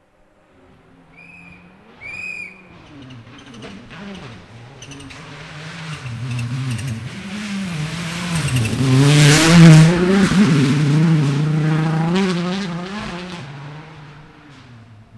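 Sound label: Subaru Impreza WRC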